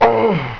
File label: Small Ship Destroyed; Bedlam